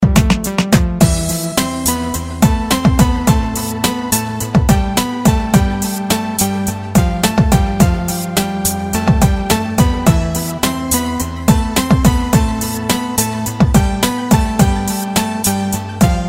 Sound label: beat music